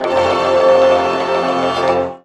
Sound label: ZIMB HIT; Best Service; World Colours